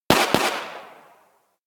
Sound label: pistol shots; anemoi